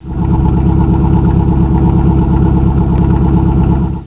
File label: rover p6 idle